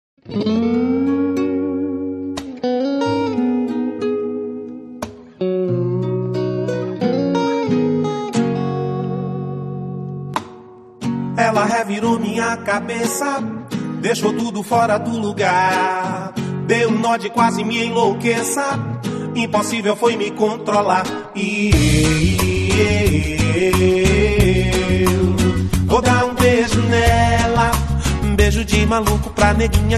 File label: axe trio eletrico; sampler axe